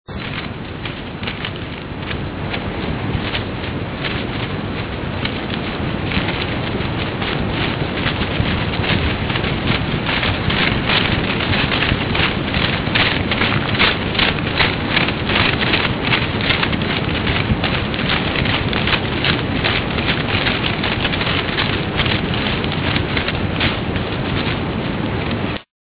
neve; rumori; inverno

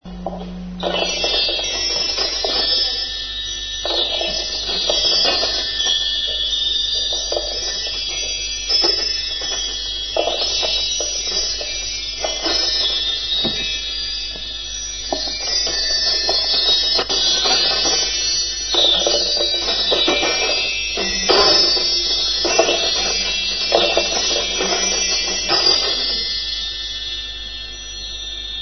scacciaspiriti; CHE SUONO HANNO; dei cristalli che cadono in una grotta?

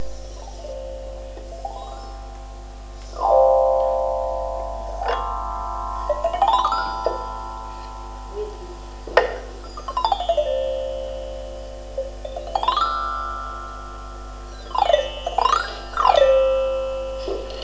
xilofono

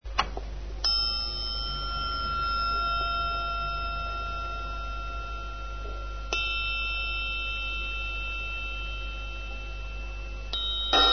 triangolo; CHE SUONO HANNO; e una cosa che inizia, ma poi finisce male?

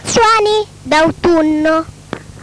suoni e rumori dell'autunno

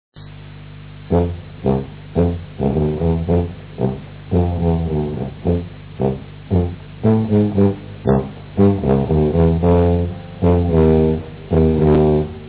basso; inverno

